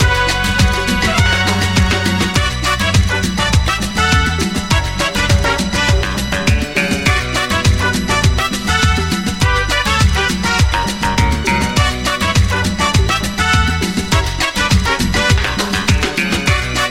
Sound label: GuitarMusic